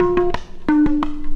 Bongo 175, Perc Loops, Drum&Bass; EZRollers

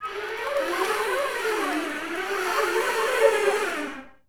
FL FX, Orchestra; Best Service; Peter Siedlaczek's Advanced Orchestra

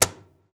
CAJON; Univers Sons - Raricussions

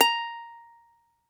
uke A#4

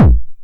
909 BD Tape Sat

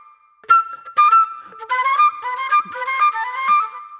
Spit Plate Flute

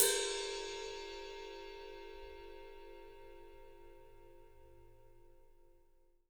PW RIDE, RIDE CYMBAL, 3D Drums; 3D Sound

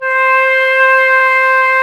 FLU, Flute; Sound & Vision - Gigapack I CD 2 (Roland); Brass&Woods2

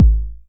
909 BD; Goldbaby Tape808 & Tape909; The Tape 909

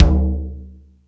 Rap Session, E-MU Pro-Cussion

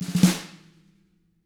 ruff, snare; ILIO; Double Platinum Drums 1

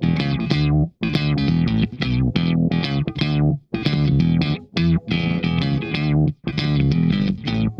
SHOT, Funk Guitar; 90 s Sample CDs; Zero G

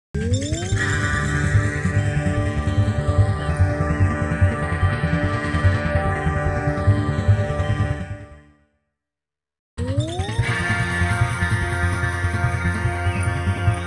Rhythm + Mix; Klaus Schulze Collection

